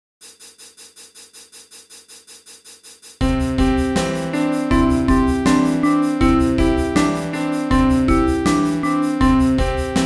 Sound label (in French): au clair de la lune avec sonic pi; epn les pieux